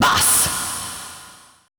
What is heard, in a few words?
FX VOCAL